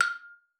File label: F5, xylophone; Xsample VOL-4